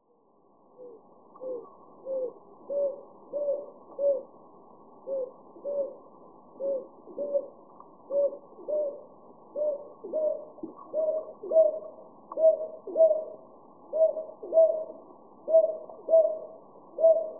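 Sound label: Northern Boobook; aobazuku; koe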